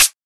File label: old school low treble high hat sample D key, pedal hi-hat; hat samples